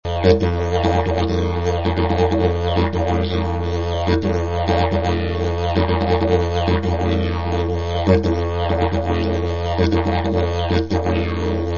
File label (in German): Rhytmus Teil gespielt